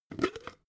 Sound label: jar glass plastic open cap